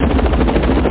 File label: HELI OUT; ASSAULT